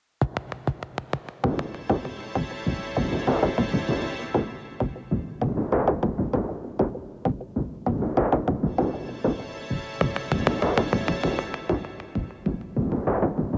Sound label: Tension